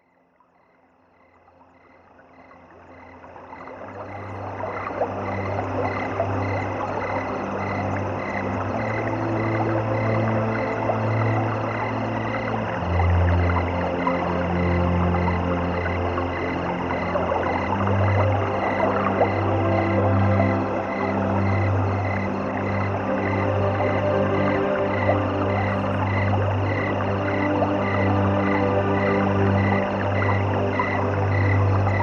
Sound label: SoundBath